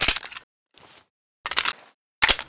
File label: Reload; Weapon Package FortWars